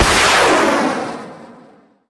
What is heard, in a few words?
missile; gmod; lvs planes; weapons